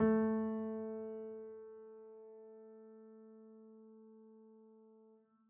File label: piano sampler